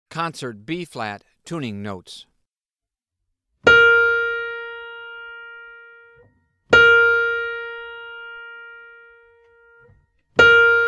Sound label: Tuning Notes; James „Jamey“ Aebersold Play-Alongs